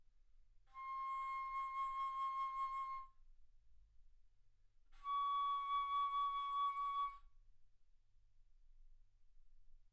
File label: Bass Flute ff C6 Db6